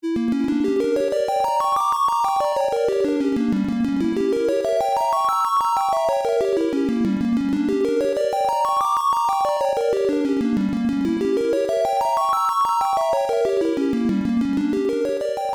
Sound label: Start Interval; Tecmo Knight; arcade